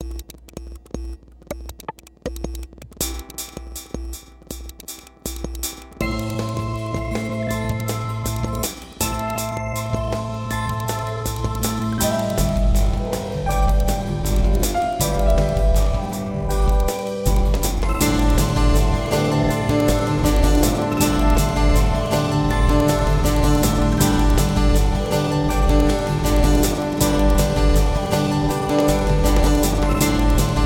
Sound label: Fanfare